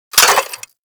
Fire Sweetner, Weapons; Warframe; Gorgon

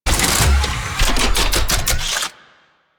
Anti Aircraft Gun Charging; Weapons; Warframe; Archwing

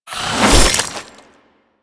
Inf Boomerang Whoosh A; Warframe; Weapons; Infested

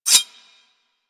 Whip Mech Rotate; Grineer

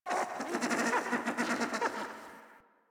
Venus Landscape Mid Creatures; Ambience; Warframe; Venus Open World

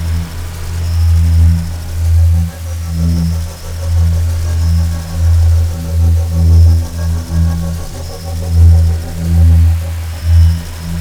Guardian Shell Invulnerable Loop; Warframe; Focus; Vazarin

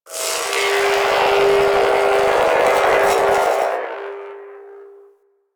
Resonant Energy; Ambience; Warframe; Corpus Railjack

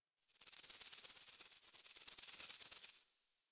Forest Creature Rattle High; Warframe; Grineer Forest

